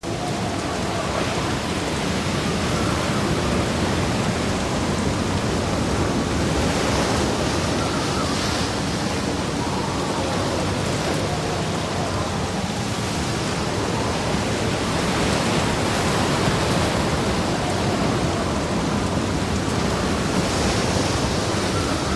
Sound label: beach; GTA - Long Night